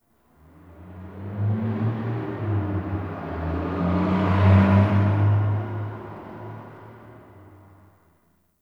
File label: Distant Traffic D; Warframe; Ambience; NineteenNinetyNine